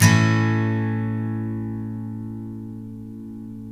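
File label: steel A major down, CHORDS STEEL, Guitars; Spectrasonics; Hans Zimmer Guitars VOL-1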